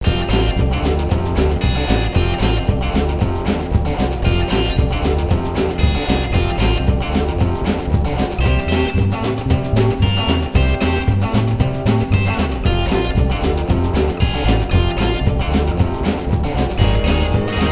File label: hen house rock